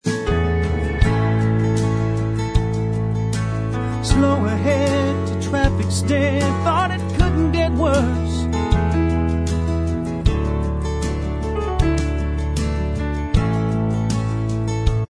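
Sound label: backing vocals track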